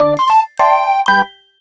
short intro

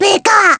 Damage Fly; SSBBrawl; Pikachu

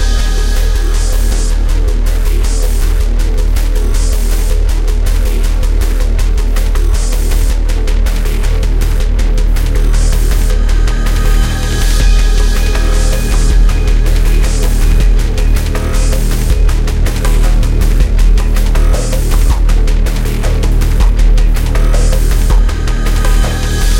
chase; Mirrors Edge

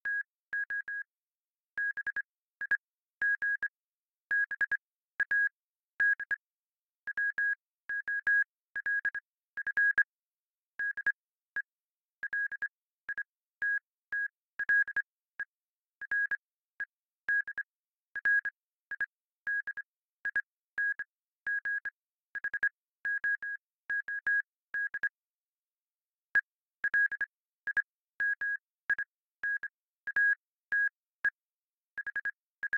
Morse Code; Wolfenstein 3D (DOS)

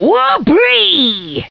miners; Candyadv

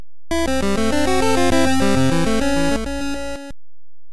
Jingle; Ron Card Combo

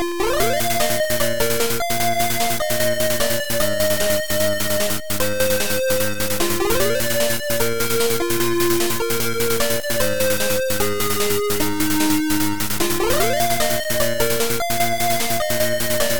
alt beat

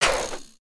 SMALL SHADOW DIE; DTL Proto4

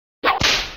Unused Whip

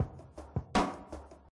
shell beat sound

